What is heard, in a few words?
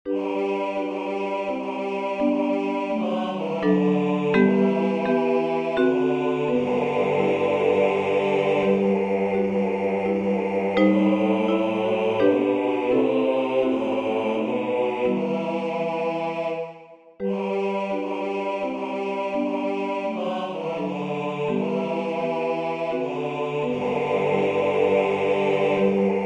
Basse